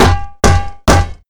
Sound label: Metal Canister Impact Hard